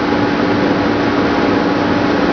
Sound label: Sr1 idle; finnish